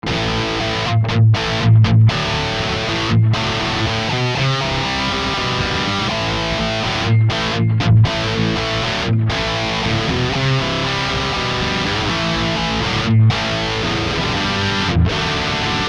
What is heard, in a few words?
Liquid Profiling Peavey 5150 4x12 Tonestack Model